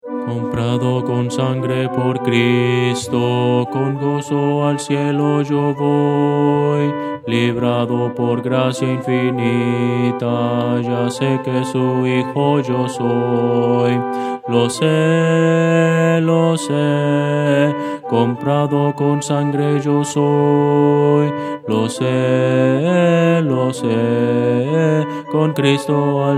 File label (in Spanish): contralto, himno; Voces para coro; comprado con sangre por cristo